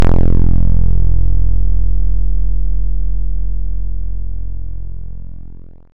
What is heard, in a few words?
Phase Dist PWM